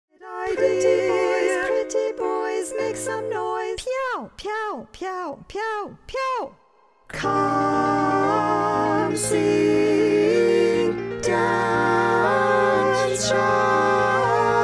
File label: Long Notes No Tenor; Auditions